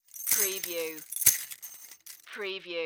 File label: Chain Rattle Restrain